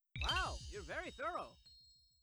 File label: collect; battleblock theater